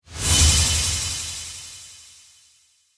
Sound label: Whoosh Shimmer, Flutter, Accent, Transition; Production Element, Imaging Element; Whoosh Sound Effects